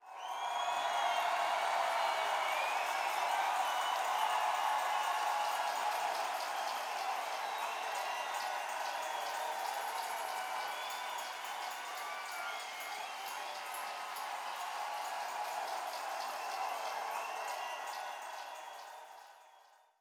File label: 140 BPM Break FX Crowd; Vengeance - Essential Clubsounds Vol.5; Catch a Plane Kit; A#min